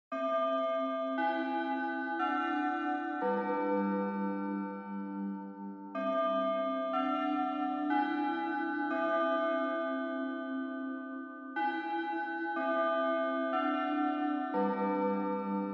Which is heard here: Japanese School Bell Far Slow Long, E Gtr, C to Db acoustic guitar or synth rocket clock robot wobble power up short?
Japanese School Bell Far Slow Long